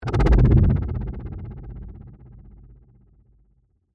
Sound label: Whoosh Filter, Flutter; Whoosh Sound Effects; Whooshes